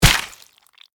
Impact Skull Blood, Horror, Impact, Skull, Blood, Flesh; Blood and Guts